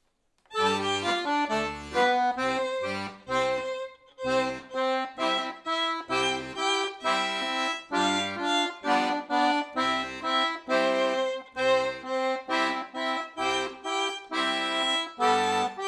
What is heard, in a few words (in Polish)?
podklad muzyczny; gwara